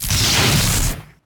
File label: Melee Swipe; Grounder; Evolve; Kraken